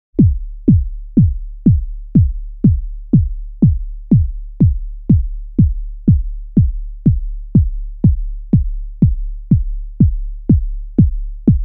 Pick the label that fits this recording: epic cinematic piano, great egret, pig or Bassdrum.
Bassdrum